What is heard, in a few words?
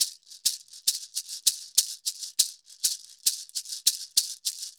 Cabasa 100 bpm; Drums & Percussion; Best Service; Festa Latina VOL-1